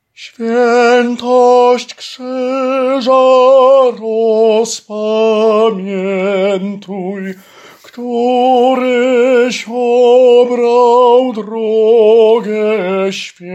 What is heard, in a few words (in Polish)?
TENOR; Swietosc krzyza